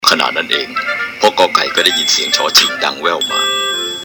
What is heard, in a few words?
cartoon